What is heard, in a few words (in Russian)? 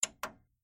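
zvuki cd-pleera, CD player sounds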